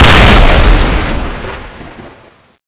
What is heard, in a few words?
lance special attack